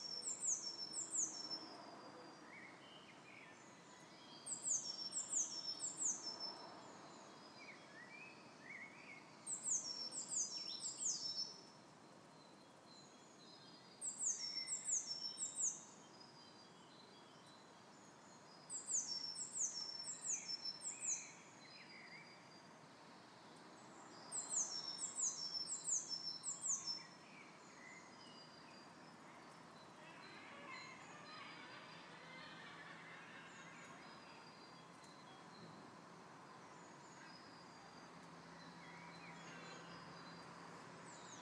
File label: early birds